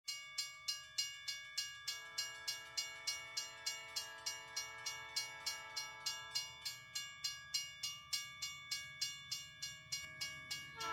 Train doppler, Train approaching a level crossing; Probably a Southern Pacific locomotive; American level crossing, with a mechanical bell

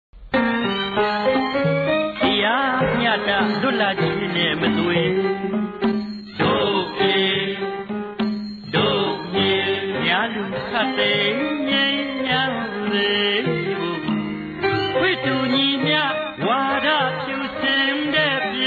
Burmese anthem

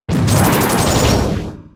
Tornado; Scary

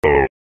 Brup

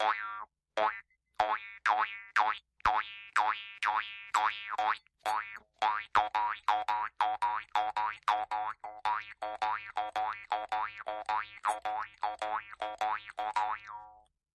Jaw harp

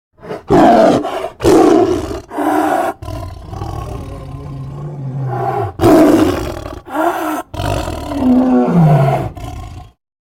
Tiger, A tiger, with a lion in the background; recording of a tiger; Lyle Lyle Crocodile

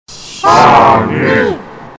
cheer slowed and reverbed

